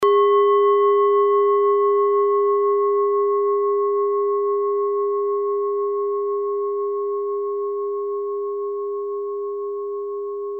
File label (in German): klangschale orissa; Die Klangschale kommt aus einer Schmiede